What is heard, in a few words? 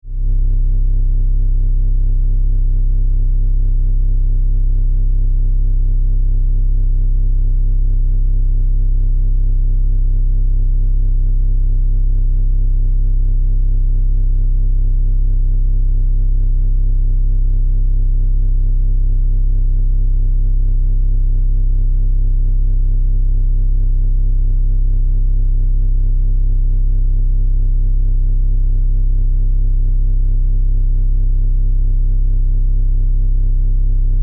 Force Field Energy, Source; Science, Fiction; BlastWave